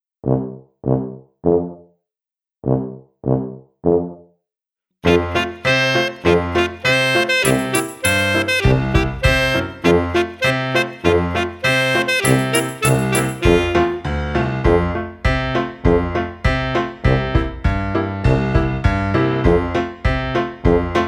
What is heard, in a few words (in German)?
guggemusig playback; dguggemusig